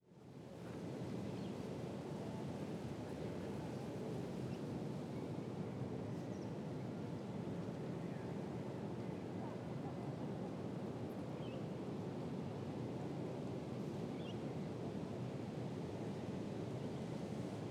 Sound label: Ambience, School, Univ; City Sounds